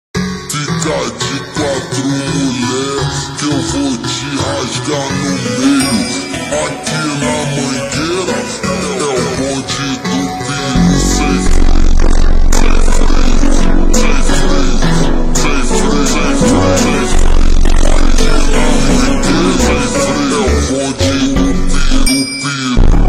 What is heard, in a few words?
Super Slowed Reverb, funk